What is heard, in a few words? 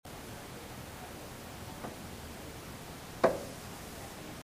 2 knocks room empty; Again the room this came from was empty at the time.